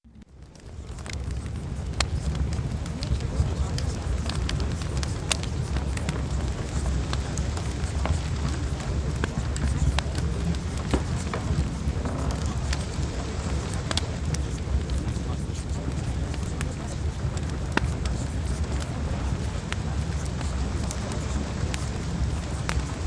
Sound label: Fireplace